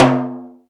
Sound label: DM5 Perc, Alesis DM5 drum machine